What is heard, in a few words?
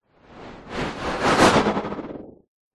sound design whoosh white noise stuttering fast